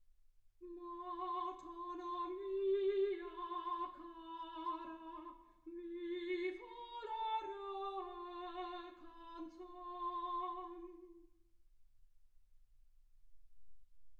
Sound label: soprano